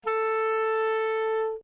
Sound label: real Clarinet 440